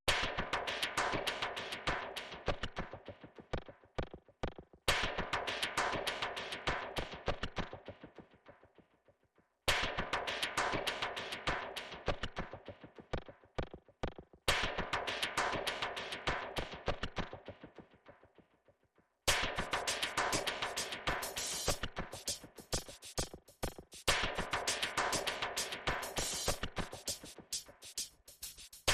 Drums And Percussion

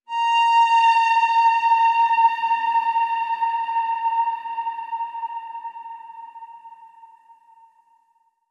Ping Dreadful Wail

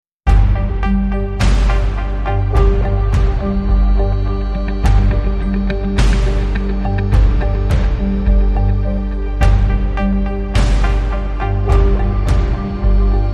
Less Guitar

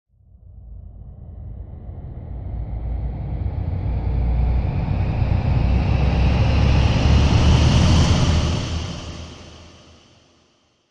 Windtunnel Rise

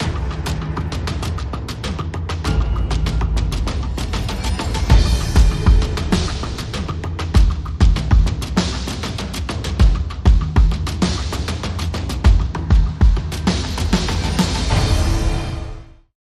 Drums and SFX

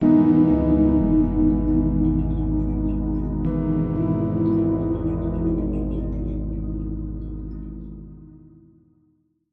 Piano Impressions Sting

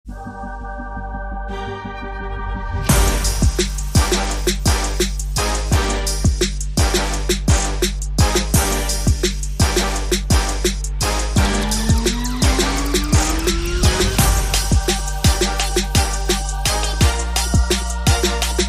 Holy Trap

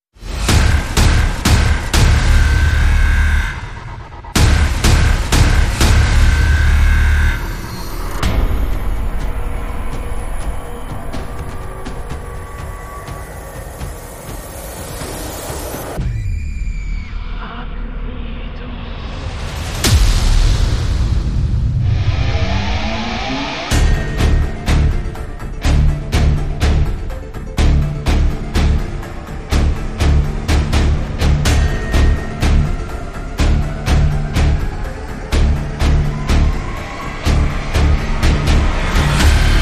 Instrumental No Vocals

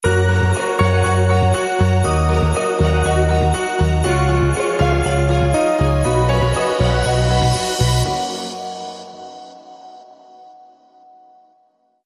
Joyful And Inspiring, No Drums Sting

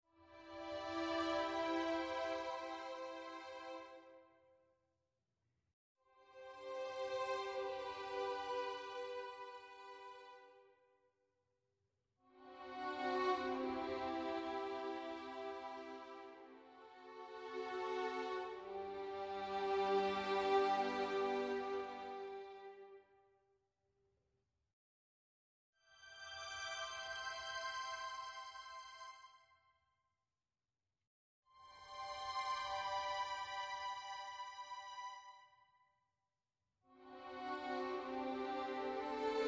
Strings Only

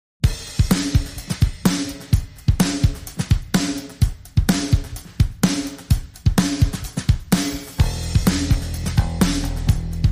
Groove

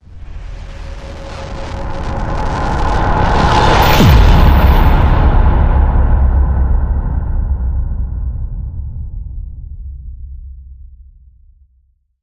Ionic Charge Transition

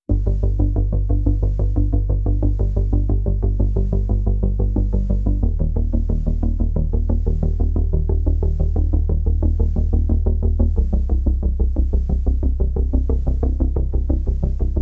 Bass

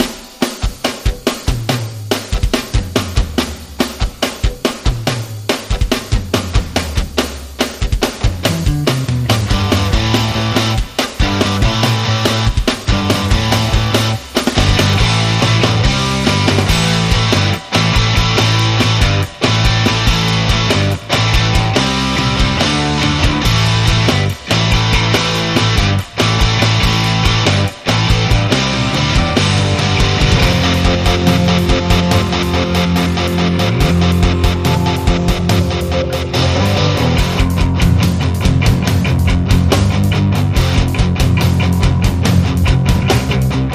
Anthem Rock, Underscore

